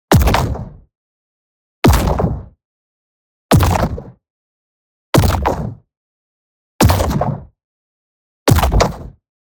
EXPLOSION SMALL Noisy Sweetener; boom library; Matter

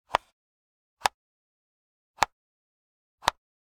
Generic Button Wood Small; boom library; The Interface

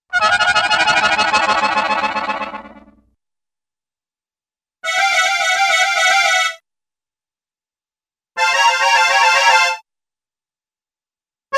Concertina - Tremolo (ie Rapid Fluttering Sound); Cartoon; Hollywood Edge; Cartoon Trax

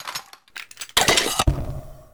Gun Reload Flamethrower, Weapons Foley; SFX Kit

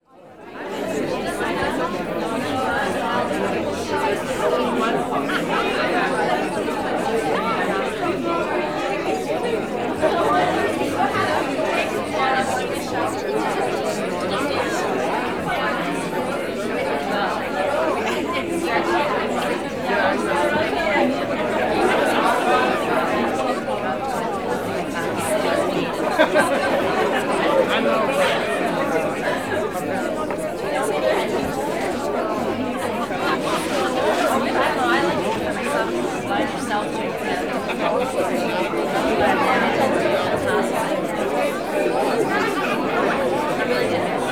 Crowd Indoor Busy Small Area; Walla; Sound Ideas; Walla Series

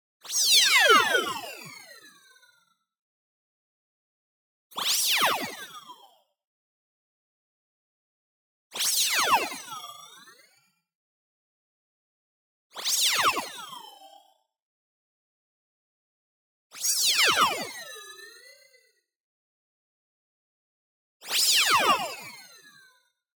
TONAL Shifted Saw Delay; boom library; Anime Essentials

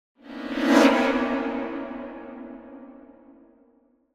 Symphonic Hit Short Show Up Chords; Hollywood Edge; sound designer's toolkit v2

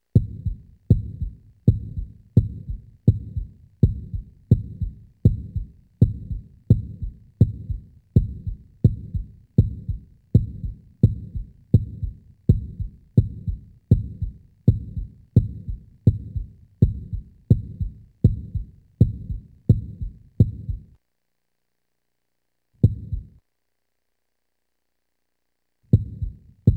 Heartbeat; Halloween Havoc - Sound Effects; Ktel

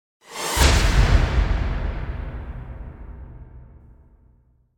Whoosh Air Impact - Heavy Metallic Hit; Hollywood Edge; sound designer's toolkit v2

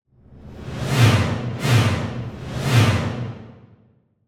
Symphonic Hit Heavy Horror Final Chords - Triple; Hollywood Edge; sound designer's toolkit v2

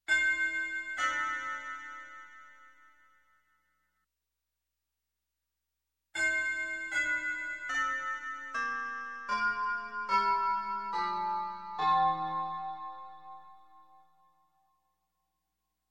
Chimes double Hit, Music, Percussion; Sound Ideas; Series 2000

